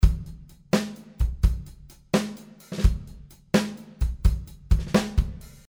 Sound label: DRUMS Dry